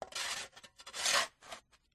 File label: shovel clearing snow from path; toolmisc; field reports